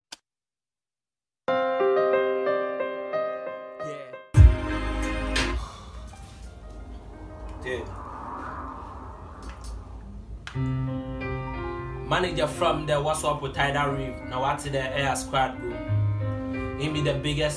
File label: Freestyle